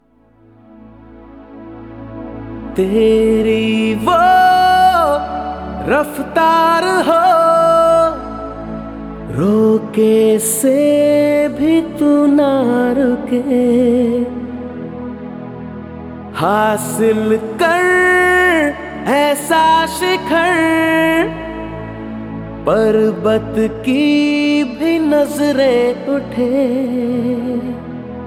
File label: Male Slow